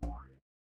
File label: cartoon boing